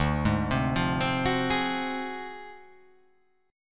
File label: D 7th Sharp 9th Flat 5th D down, chord